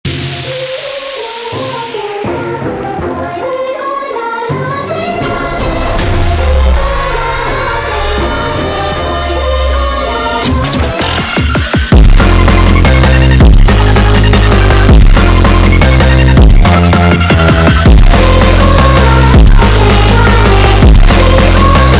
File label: Epic Phonk sped Up; funk